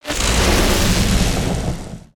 Lightning Swing; Spinjitsu

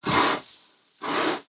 Pets Tortoise Idle; creatures; Lego

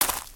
Footstep Leaves; creatures pets

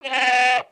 Pet Goat Hurt; creatures pets

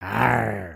Walk, creatures organic

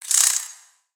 Spider Idle; Lego; creatures mechanical

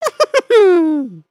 Clap

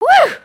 stink

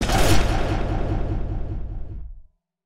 multi hit; aronwk collection; ndaudio

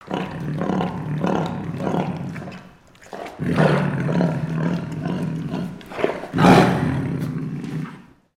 Jungle Roars; gnarled forest

